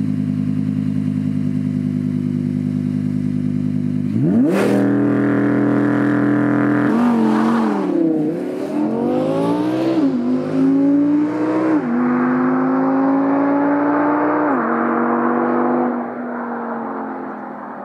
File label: Audi R8 Coupé V10 performance quattro sound